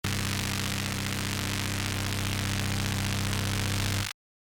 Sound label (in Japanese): 電流